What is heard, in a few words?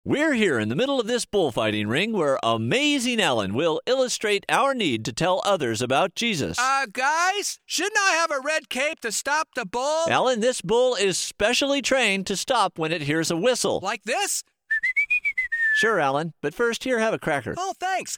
Whistle